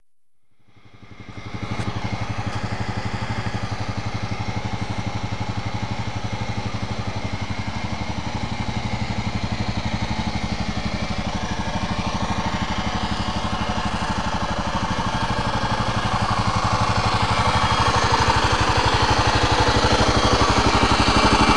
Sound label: Engine Noise, klr650; what's this noise